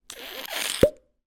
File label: cartoon squeeze pop; zapsplat